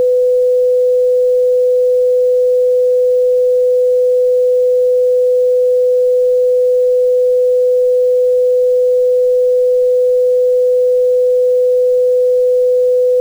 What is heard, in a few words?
buzz sine